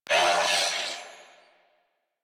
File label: Death Grineer Male; New War; Narmer